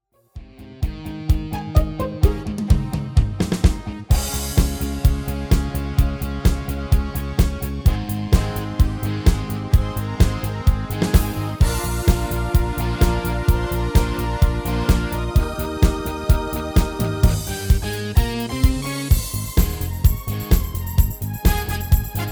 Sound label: MIDI